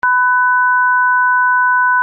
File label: ear phantom stereo